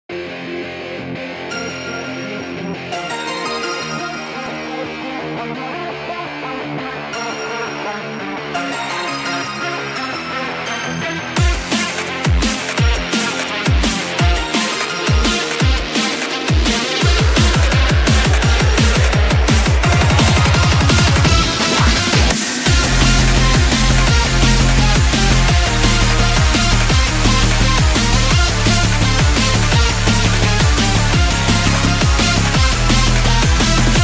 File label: noise canceller (Instrumental)